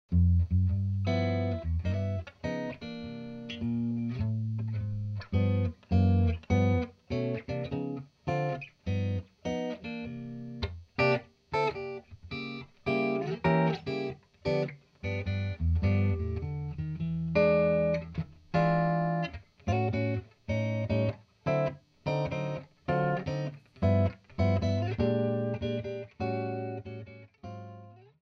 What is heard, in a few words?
guitar jazz; Jazz 1